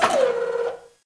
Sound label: telescopic Motor stop; Kerbal Space Program; KAS